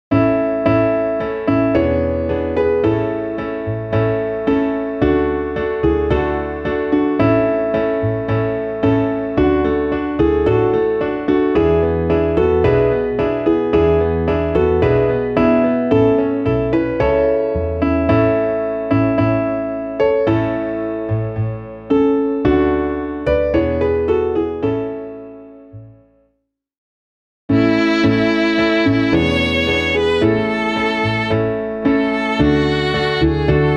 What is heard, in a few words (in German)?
midi klavier; Seemusik; Der Möve Silberschrei